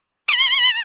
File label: squeeker